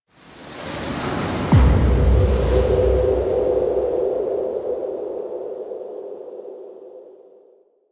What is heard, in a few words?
Intro Sting; Zariman; Table For Two